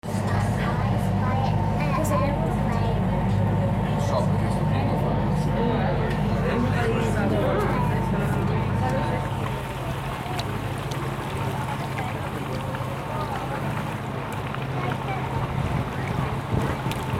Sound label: sounds of canal boat